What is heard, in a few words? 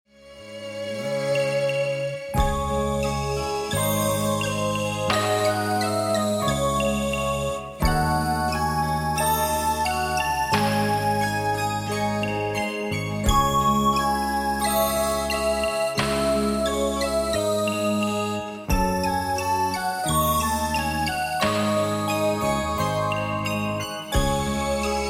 Karaoke